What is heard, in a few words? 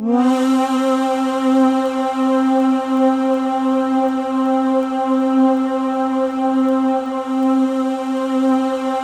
C4, Synth Leads